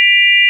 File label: sine; ものづくり基礎工学（情報工学分野）; 音の波形と分析